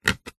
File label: wood long branch pole single scratch fingernail; foley